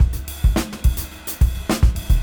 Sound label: Junky Funk 107 BPM